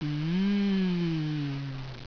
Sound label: mmm, sultry; tactics